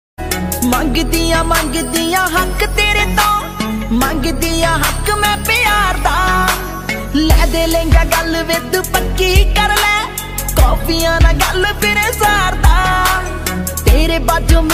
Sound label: Desi Punjabi Ringtone